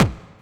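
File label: hard bass drums; Bazzdrumz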